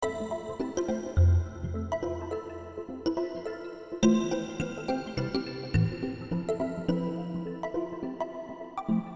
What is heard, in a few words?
Organ Fmaj7 105